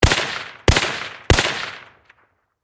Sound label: Rifle M1 Carbine Semi Automatic Triple Shot Close Perspective; Warfare